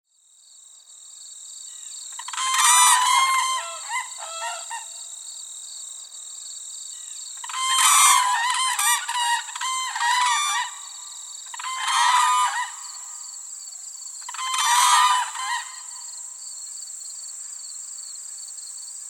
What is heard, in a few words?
Whooping Crane